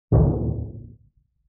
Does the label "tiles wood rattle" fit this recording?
No